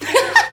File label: "ah ha ha"; Voice; ooh ahh